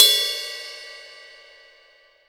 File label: cupped, Cymbals